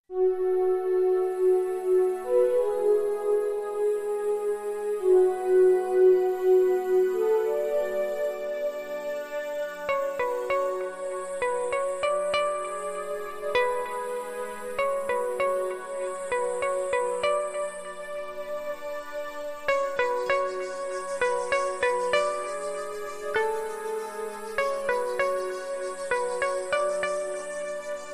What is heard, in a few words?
Ambient